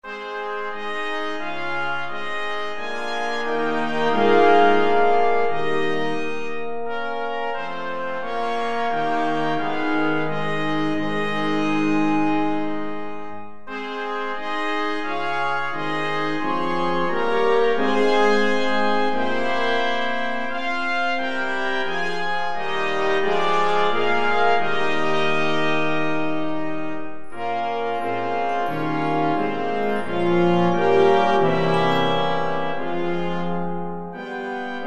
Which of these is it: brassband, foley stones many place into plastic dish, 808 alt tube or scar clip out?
brassband